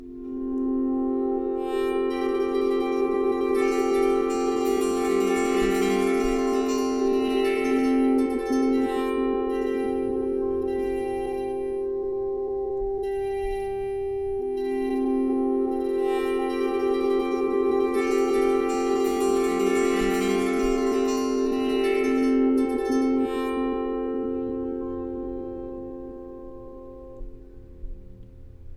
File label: flow Bowed